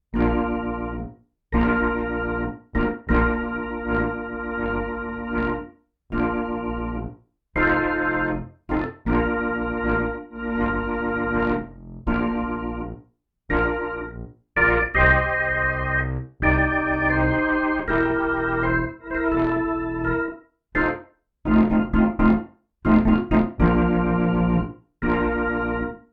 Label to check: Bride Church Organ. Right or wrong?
Right